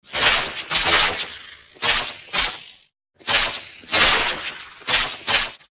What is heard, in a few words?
lazer fight